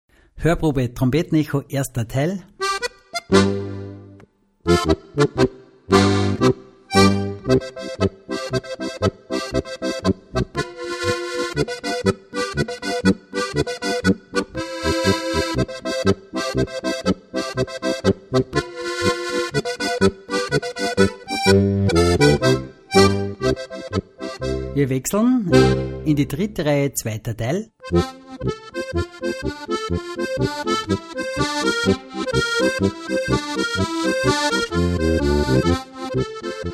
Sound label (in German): Trompetenecho; Steirische Harmonika